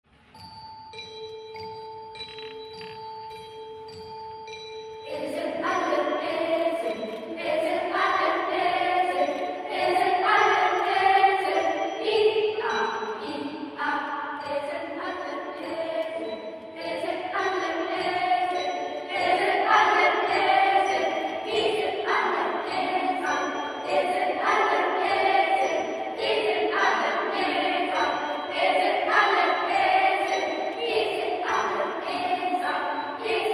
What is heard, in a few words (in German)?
Zweistimmiger canon canone a due voci con voce ostinata; Esel aller Esel